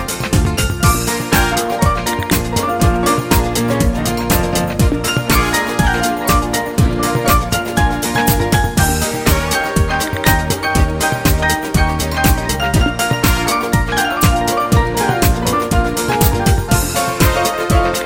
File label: Latin Club